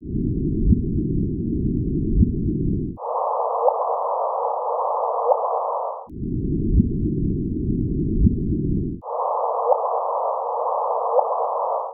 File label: the sound of two black holes colliding